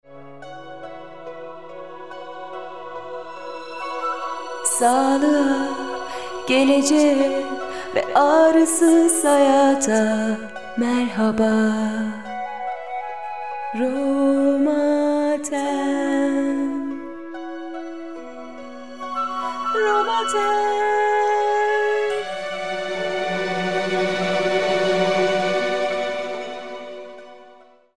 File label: jingle; Romatem FTR Kurumsal Müzik